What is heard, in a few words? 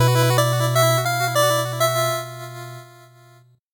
8bit jingle normal